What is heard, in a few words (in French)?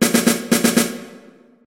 Mib rythm